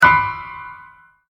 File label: Piano Sound; granny chapter two; android; mobile